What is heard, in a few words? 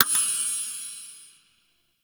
Boon Rollover, UI; Duviri